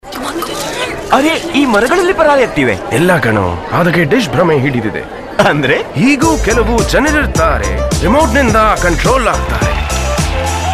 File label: kannada singer, male voice